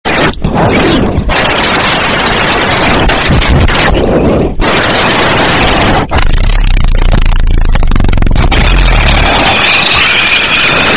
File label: shocked woman